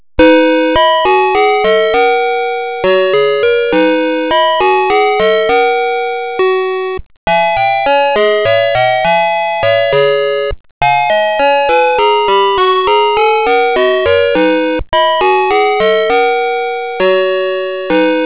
ballgame, Kiddie Ride

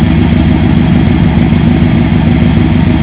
car, Kiddie Ride

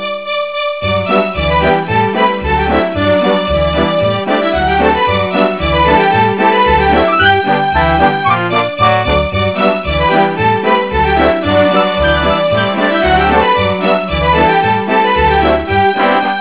SLEIGH; Kiddie Ride; Kiddie Ride Sound & Control

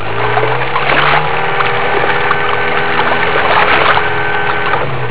boat; Kiddie Ride; Boat - Engine (Boathorn, Seagull); Kiddie Ride Sound & Control

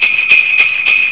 XMAS BELL

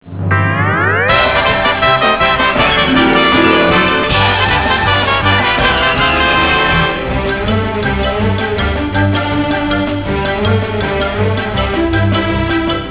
merrie

